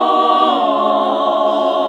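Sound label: Classical Sing, choir; Best Service Gigapack 1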